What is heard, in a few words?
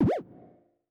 Cartoon Land